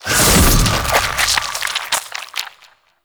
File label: Shield Cast; Warframe; Garuda